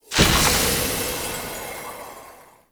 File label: Root Cave Magic Spore Puff Large; Ambience; Props; Neathuns Root Cave